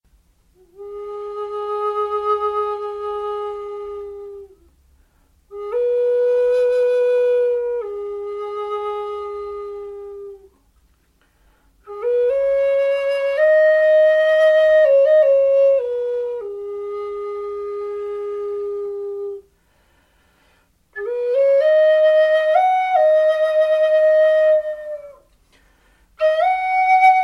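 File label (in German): Gis Flöte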